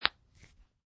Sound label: Bone Crack, Martial arts - knuckle or neck bone ligament cracking sound; Martial arts - knuckle or neck bone ligament cracking sound - variation 2; Injuries; Combat; Injury Sound Effects